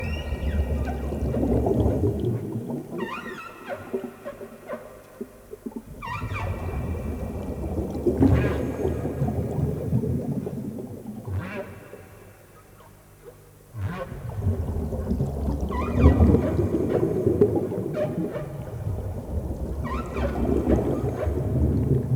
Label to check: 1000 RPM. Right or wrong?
Wrong